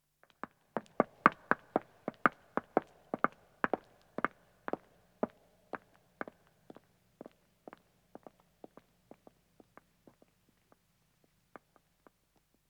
Stone Walking, 2 Women Depart, Footsteps; BBC Sound Effects Library; Footsteps II

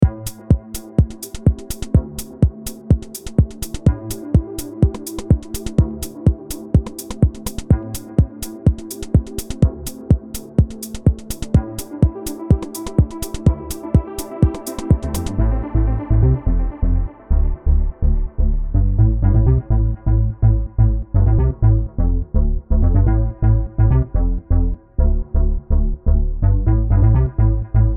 Rain, Chill

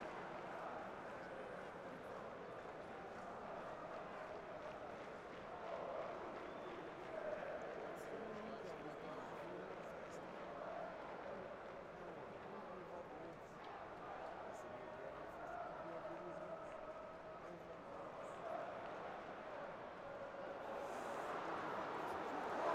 Crowd Talking, Soccer Stadium Ambience; Sonic Bat

